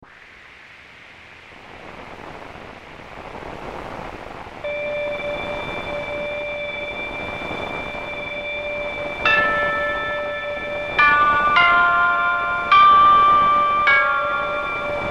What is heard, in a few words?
Hiss bells; Ghost Theme 2